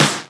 Snares; Vengeance - Essential Clubsounds Vol.4

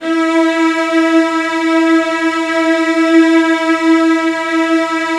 CELLOS F#4, Strings; ILIO

